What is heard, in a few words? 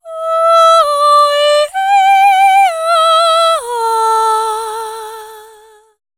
Vocal Lines - Am 70Bpm